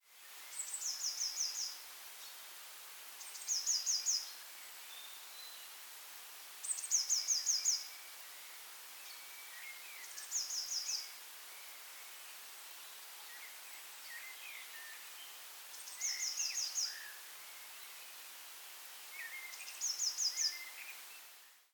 Cyanistes caeruleus; SETE

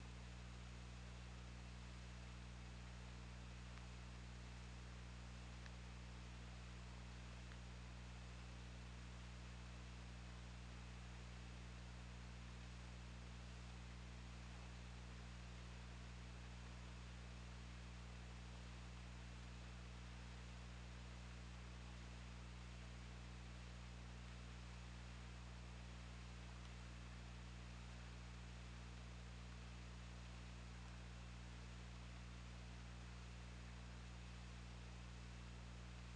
Orca; OrcaLab

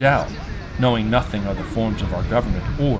noisy

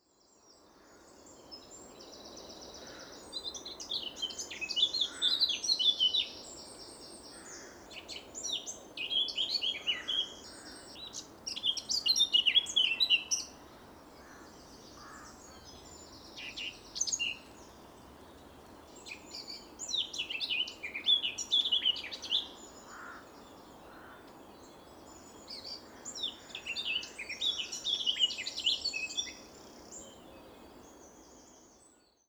Sylvia atricapilla; SETE

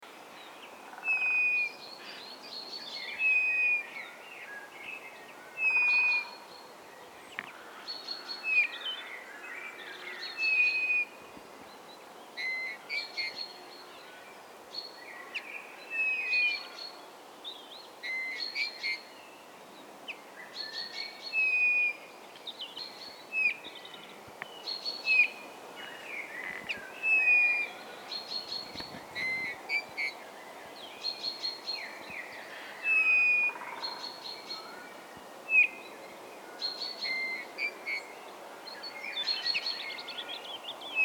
Pyrrhula pyrrhula; SETE

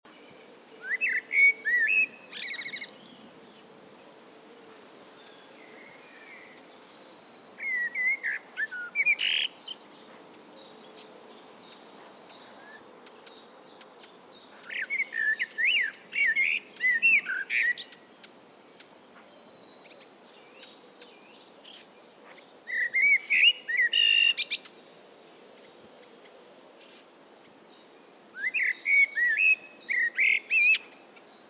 Turdus merula; SETE

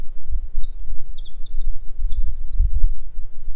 Troglodytes troglodytes; SETE

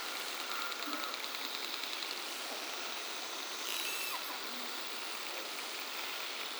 Narval

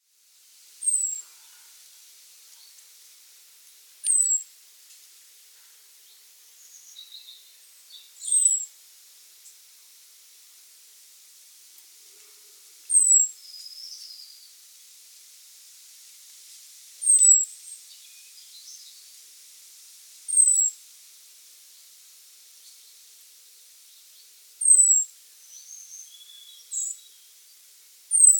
Turdus merula; SETE

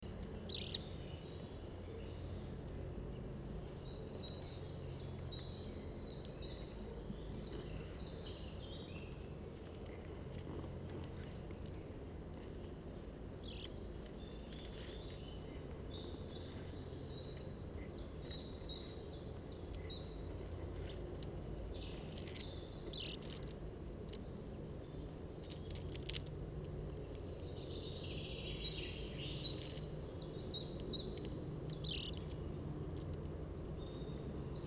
Sitta europaea; SETE

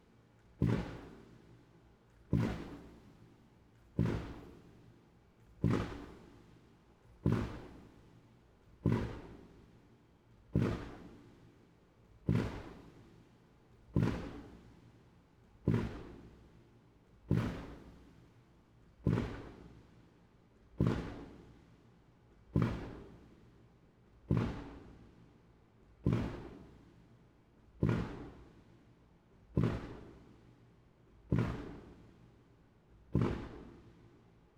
Static Pile underwater hammer; NORTEK

